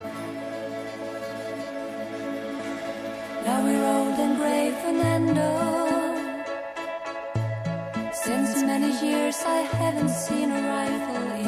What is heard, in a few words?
soft